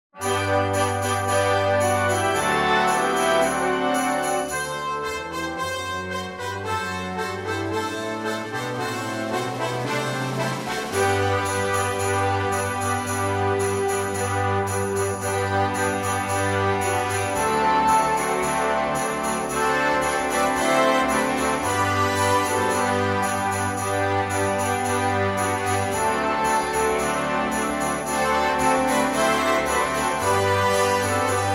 para desfilar banda, villancico; CAMPANA SOBRE CAMPANA; maquetado para desfilar; Fernando Bonete Piqueras

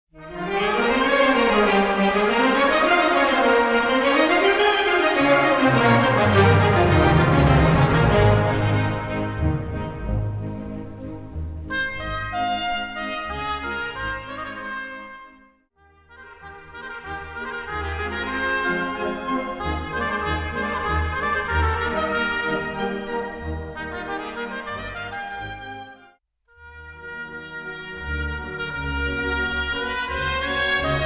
polonesa trompeta, para trompeta y banda; EL BARBERO DE SEVILLA

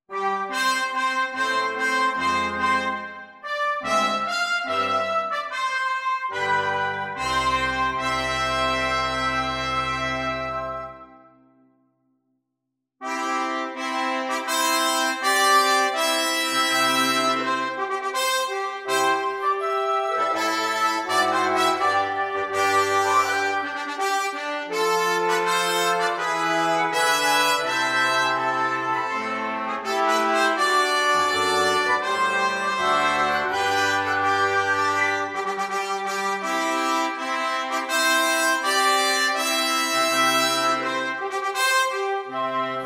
HIMNO septeto; HIMNO DE ALBACETE

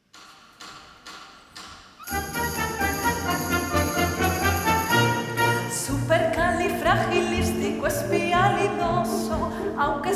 voz y banda, canción infantil para banda, con canto; Fernando Bonete Piqueras

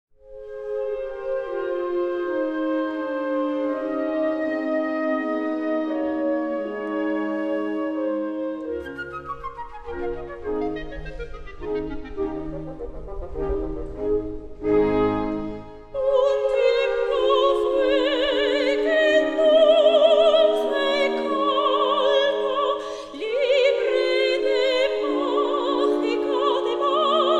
soprano y banda; JUGAR CON FUEGO, "Un tiempo fue..."; Fernando Bonete Piqueras

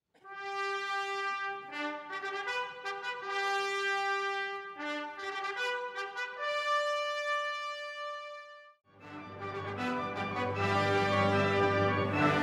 obertura, para banda; CABALLERIA LIGERA; leichte kavallerie; light cavalry

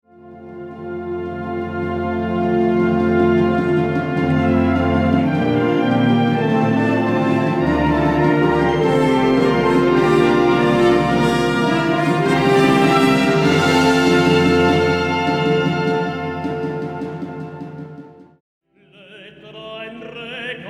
solista y banda; drummer; der schlagzeuger; villancico para soprano o tenor y banda

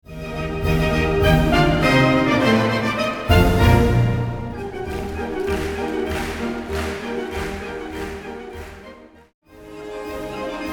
MARCHA, para banda; MARCHA RADETZKY; radetzky marsch op 228

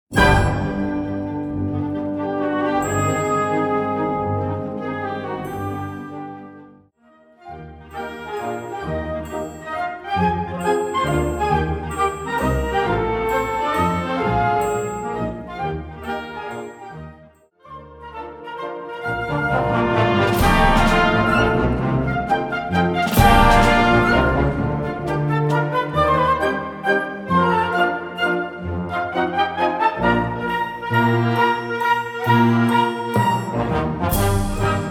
Preludio, para banda; AGUA, AZUCARILLOS y AGUARDIENTE

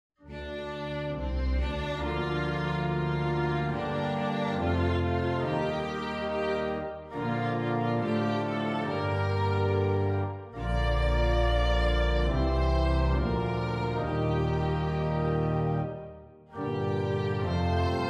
camara, cántico para violín u otro instrumento monofónico y órgano o piano; PUEBLO DE REYES

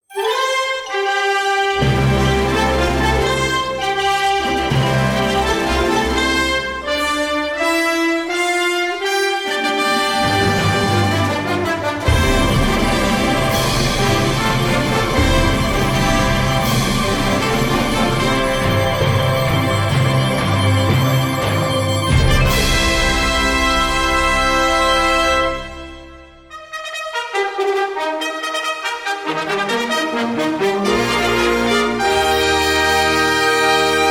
FANFARRIAS; fanfarrias para competiciones deportivas; Fernando Bonete Piqueras

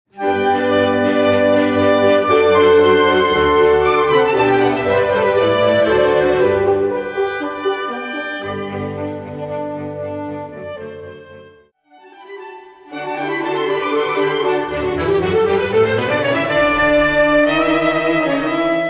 CONCIERTO FLAUTA, en sol mayor, para flauta travesera y banda; CONCIERTO PARA FLAUTA en sol mayor K 313; Mozart; Fernando Bonete Piqueras

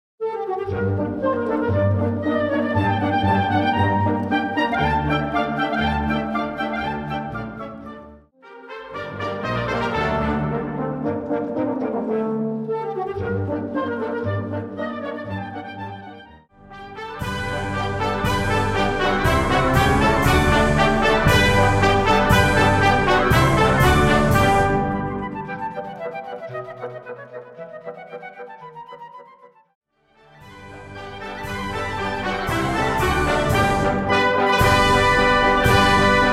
MARCHA, para banda; MARCHA TURCA; de la sonata para piano kv 331 en la mayor

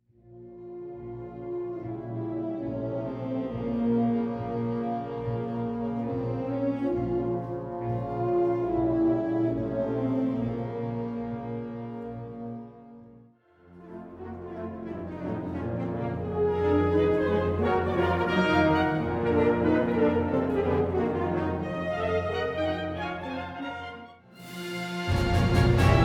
obertura, para banda; MARCHA ESLAVA; slawischer marsch; slavonic march; op 31

